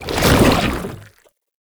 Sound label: Goo Layer, Foley Footsteps; Enemies; Warframe; Lasrian Soldiers; Lasrian Chem Strike Nox